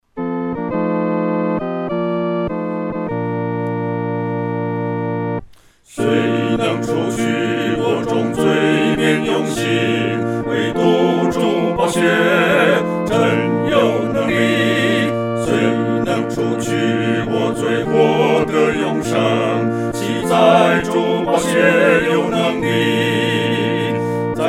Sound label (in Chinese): 合唱 四声部; 宝血能力